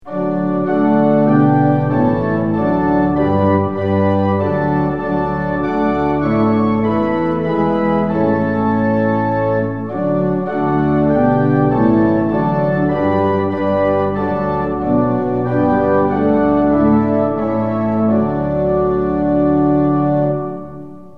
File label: tune; hymns; lillenas